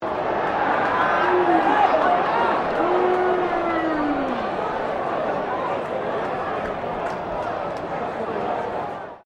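crowd 40K Melbourne Cricket Ground boo low, Cricket at Melbourne Cricket Ground; Crowds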